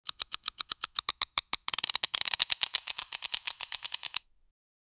sound effect that plays w, card games

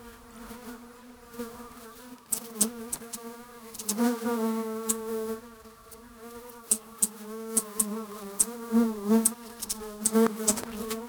flies insects buzzing; annoying

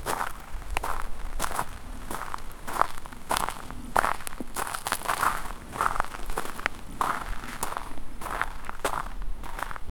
footsteps on gravel

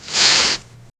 sharp intake of dry breat

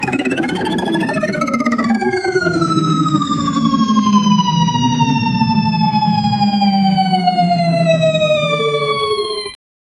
a videogame carnival fun

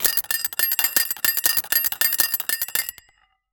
purchase sound, shop

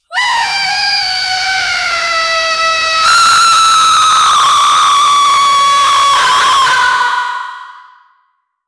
terrifying banshee shriek; spectral

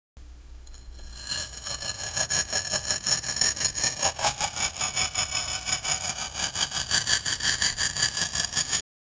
grinding a diamond on, stone

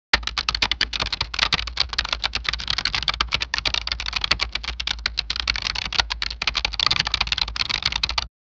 the sound of a greasy

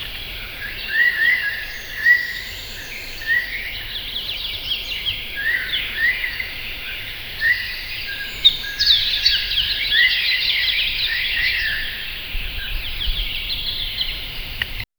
forest swamp with birds

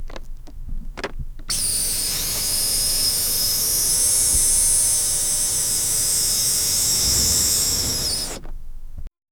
a car tire filled with

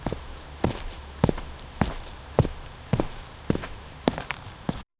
walking barefoot on sand

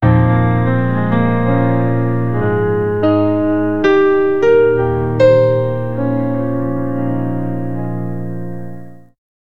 filtered piano lullaby

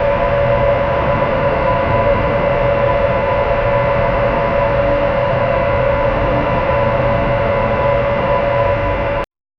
dark futuristic laborator; laboratory

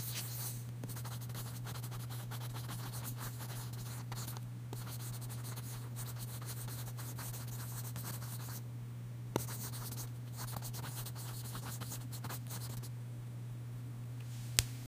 white noise with ambient, ambient noise

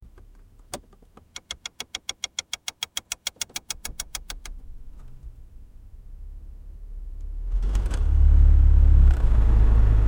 a car rotor that was; mechanical

